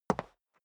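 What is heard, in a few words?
step dress boots concrete single right, footsteps; schritte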